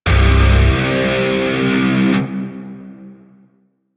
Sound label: Shawzin Hirajoshi Chord D; Grineer; DE; Warframe